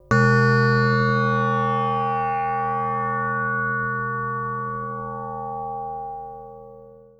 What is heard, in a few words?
Synth hits and riffs